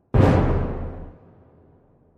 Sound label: shock jingle intense; Holy Potatoes! What the Hell?!